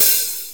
open hat e key, Royality free open hi hat sample tuned to the E note. Loudest frequency: 10426Hz